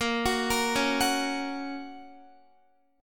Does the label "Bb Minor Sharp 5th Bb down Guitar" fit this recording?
Yes